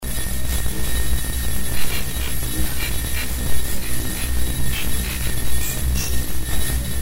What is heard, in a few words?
duck n crow; pyo